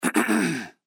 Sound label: Clear Throat